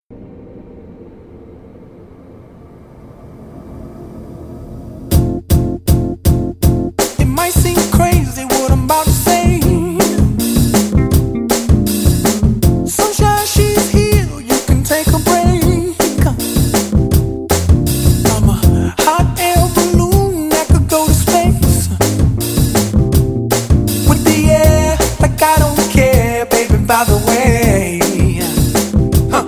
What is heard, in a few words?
happy couplet 1